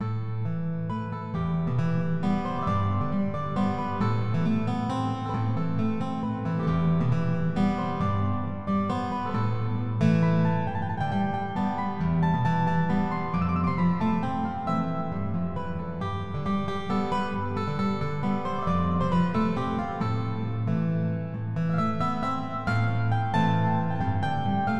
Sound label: guitar